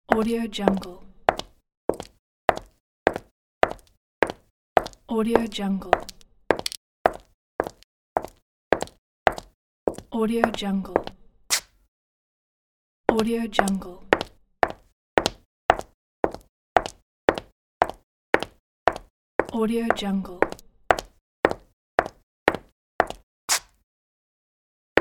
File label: woman footsteps on asphalt